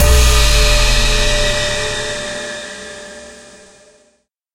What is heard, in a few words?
stinger; Mirror's Edge (2008)